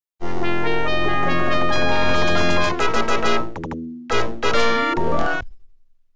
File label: OPL2; Imperial Capital Ship Destroyed; X WING